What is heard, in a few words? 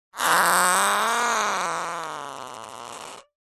human fart long squeezed out; zapsplat